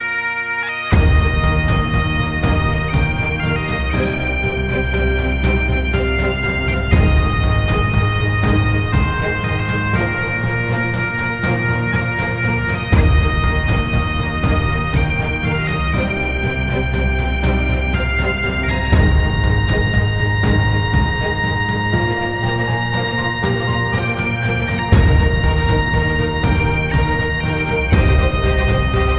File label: Bagpipes; Amazing Grace